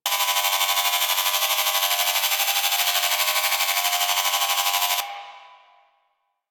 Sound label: Fortress Detection Laser Loop; Ambience; Warframe; Grineer Fortress